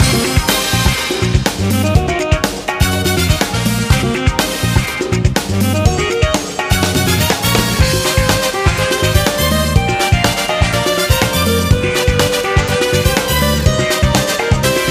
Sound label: Jazzy Dream Loop; Mochi Mochi Boy; macos ps vita ps4 switch windows xbox one gamerip 2018